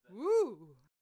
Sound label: Attack Vocals; Candlehead